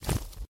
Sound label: Crack Back; Game rip; MiSide